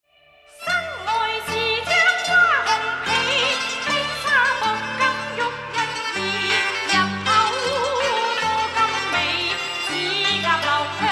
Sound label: Chinese folk